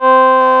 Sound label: vocoders; Sound & Vision - Gigapack I CD 2